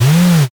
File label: servo